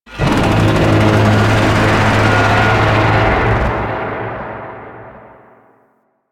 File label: Void Dragon Vocal Set Idle; Enemies; Warframe; Duviri